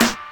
KIT, Dance Mega Drums, HIP; Best Service

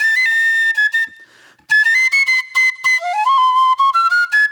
Eastern Flute; Sonic Foundry (Sony Creative Software); Manhattan Flutes; Garage Funk

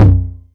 Tom Lo, Novation Drumstation 909

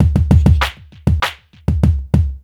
E BEAT L, Roots Funk; USB Soundscan vol.41 - Roots Funk; AKAI

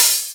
Open Hihats, Cymbals

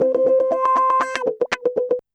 120 GROO WAH, Electric & Acoustic Guitar Loops; USB Soundscan vol.04; AKAI

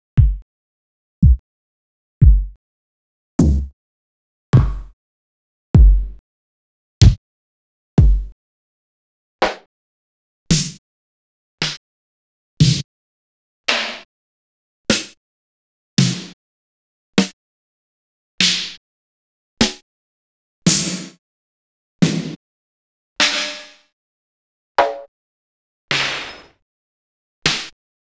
Vinyl BD SD; X-Static Goldmine

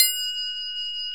Crotales, Orchestral Perc

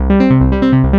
Korg, FX

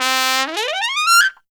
TRUMPET FX; Zero-G; Phantom Horns

